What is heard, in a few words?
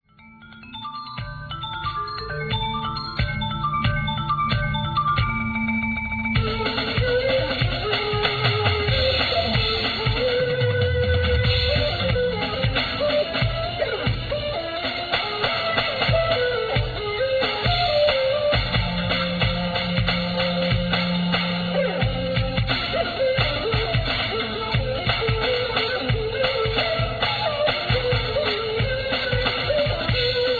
drum solo